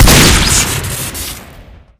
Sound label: shoot crit; ambassador; tf